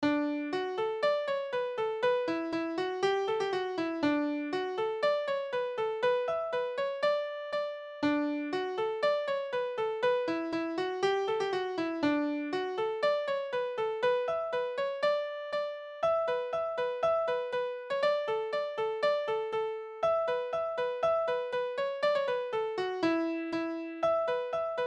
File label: Polka